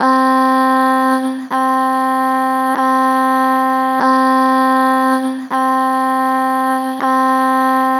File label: Str Flat Ahh, Vocals, Pitch Flat Strong